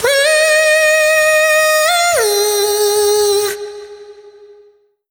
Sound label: Vocal Sample Pack Phrases Ab; Slate Digital Omnivox Vocal Sample Pack